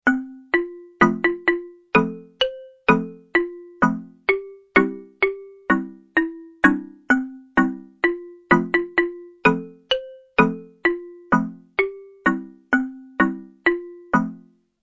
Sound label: xylophone ringtone wheels on the bus